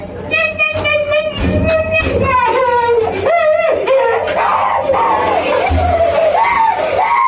acoustic sound

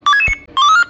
bip bip

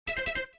super mario 64 pause

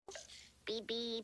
beep beep; clay; clay davis sheeeit

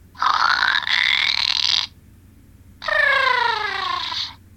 furby sleep

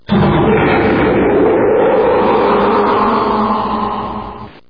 roaring

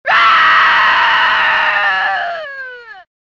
scream; armageddon jade

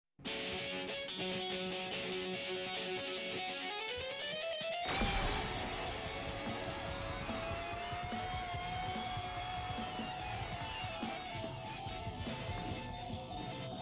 keytar solo

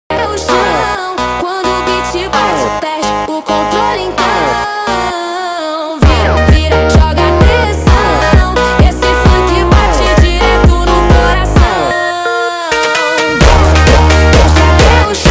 funk

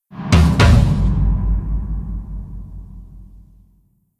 drummer finish

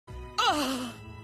akhhhhh

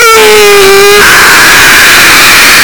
mmmmmm

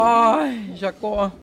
aaaaai

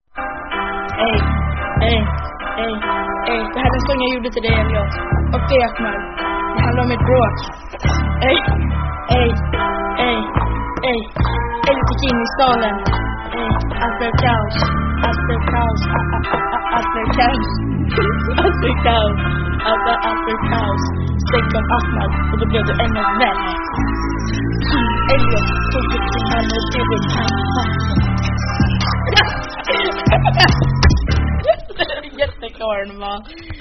rap

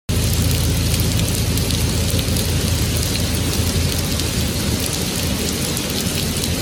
raining background sound

